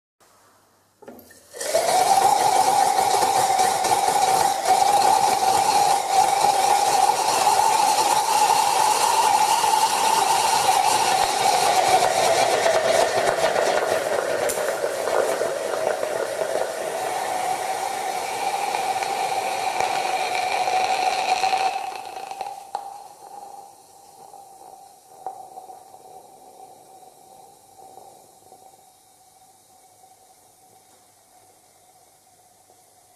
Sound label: Screaming gummy bear chlorate